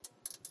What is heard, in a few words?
Light Flicker; The Joy of Creation Halloween